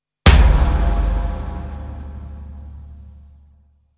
Main Hit

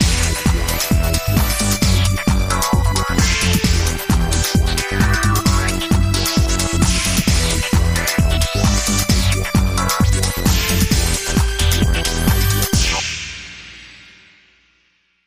Techno Unlimited

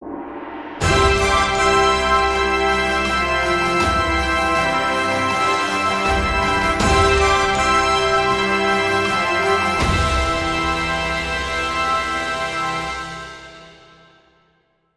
Triumphant Fanfare

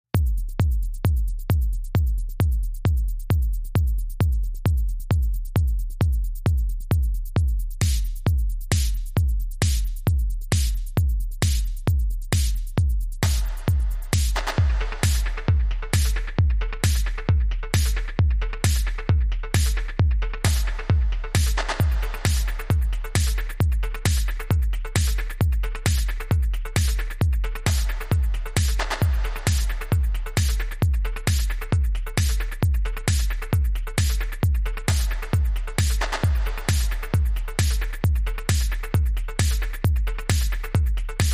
Bonus Beats

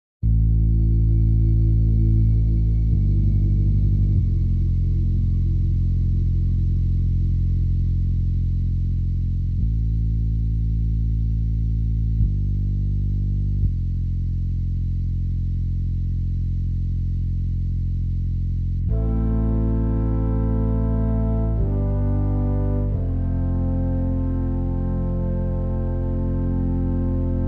Chords

